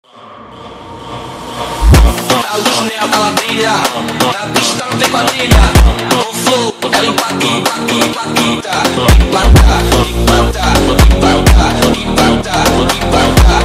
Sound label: Neon Funk